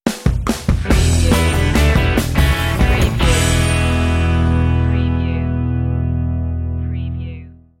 hippie stinger

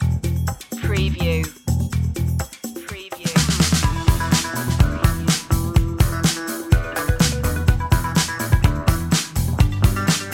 disco vibe

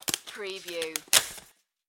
Paper Plates Separate; Household Sounds / General Household

